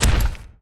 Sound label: move; salamander